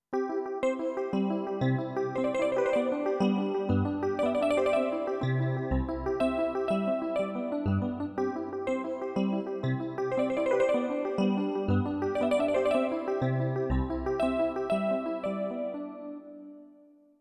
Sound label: Chorus EP 2, Yamaha Clavinova CLP-270; I SUONI - GLI STRUMENTI XG